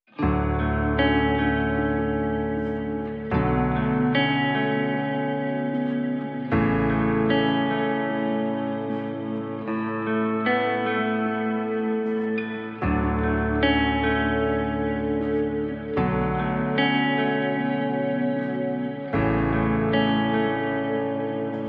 Ambient Version (No Drums)

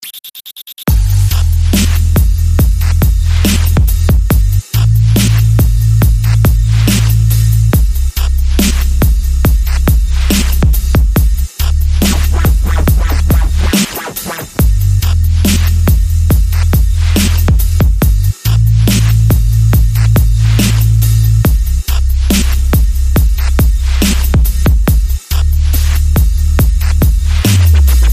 Accent Sub

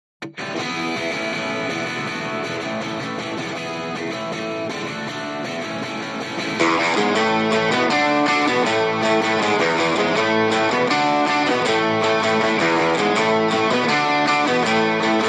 Guitars Only

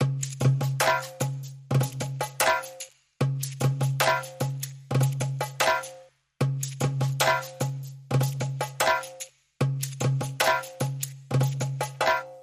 Drums

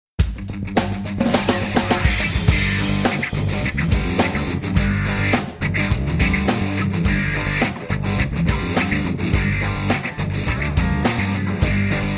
TX Rock

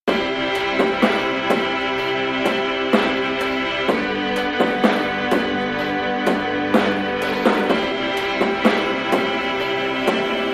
No Melody and No Synth Pedalnotes

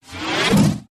Soft Tube Sweep

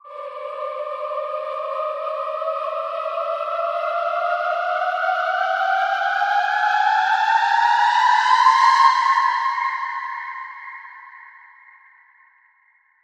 Quirked Up Shout Rise

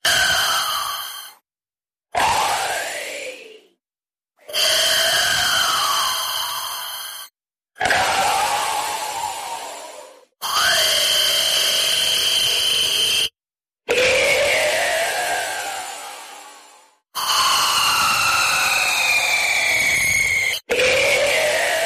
Satanic Breaths

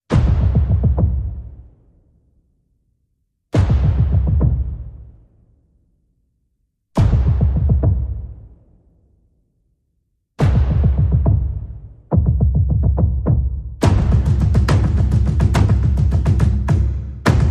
Percussion Only

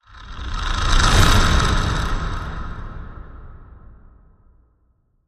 Pedal Whoosh

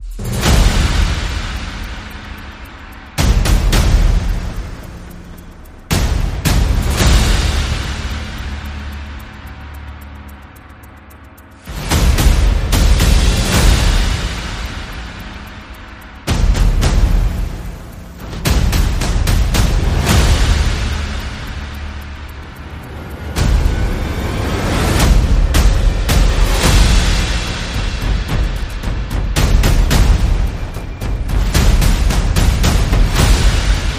Percussion and Effects

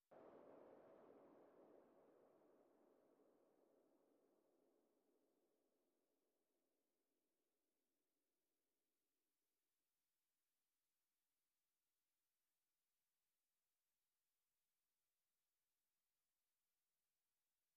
Noises

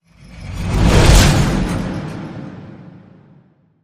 Bleacher Stomp Whoosh

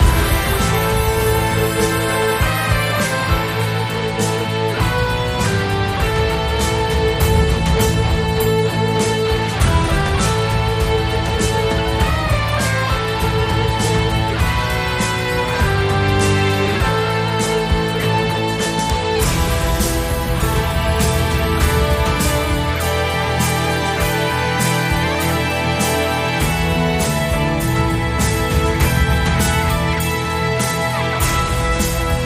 Hard Sympho Epic

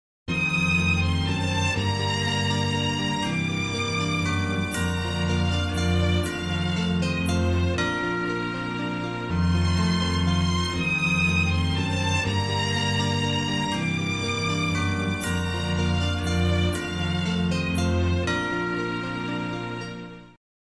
Silent Night, Brass Band and Small Group

